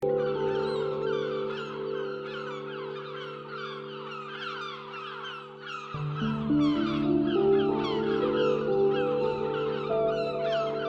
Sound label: Seagulls